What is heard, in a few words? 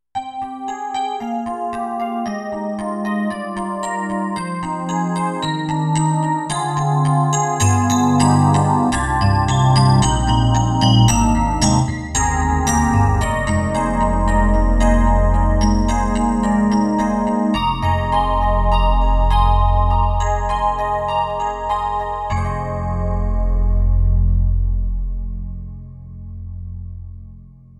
EDIROL SD-90 Enhanced Chorus EP; Enh.Legend